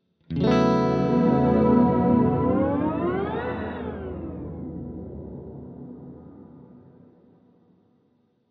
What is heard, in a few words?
WALRUS LORE Rise